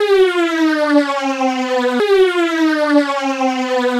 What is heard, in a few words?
SFX, Trance NRG; Sonic Foundry (Sony Creative Software); Trance NRG VOL-2